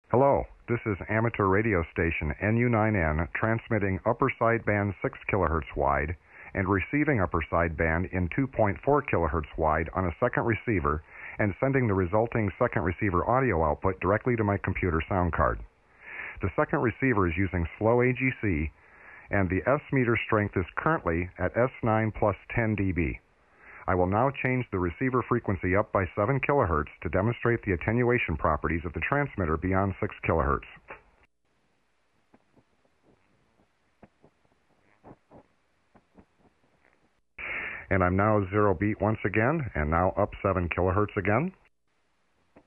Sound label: 7kHz Test